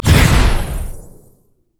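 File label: shrine turret; LoL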